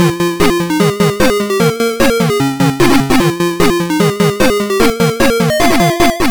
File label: Race Results; Micro Machines; Master System